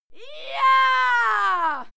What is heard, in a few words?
female cheer yah; schreie; menschen